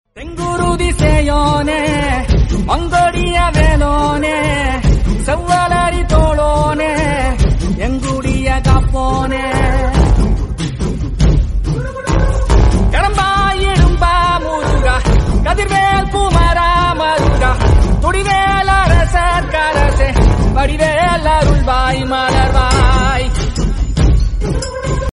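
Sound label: ringtone background music instrumental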